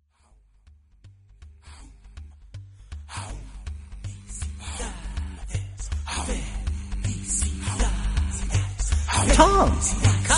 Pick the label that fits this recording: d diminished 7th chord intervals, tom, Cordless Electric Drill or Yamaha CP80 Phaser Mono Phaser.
tom